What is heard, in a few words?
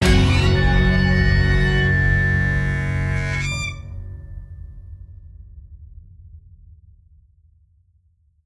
Shock; Black Mirror 2 Reigning Evil